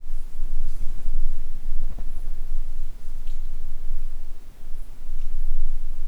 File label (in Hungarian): erdő patkósdenevérek, denevérek repülés; termeszetben